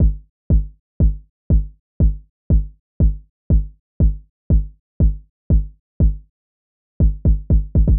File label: 909 x over; hydrogen